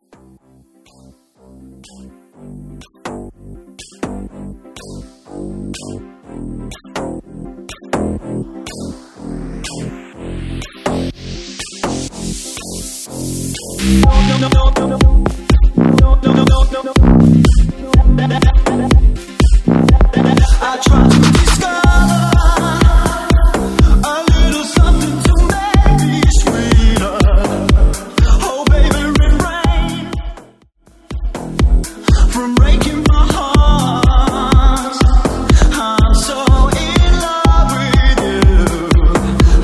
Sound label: 123 BPM